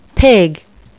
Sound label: pig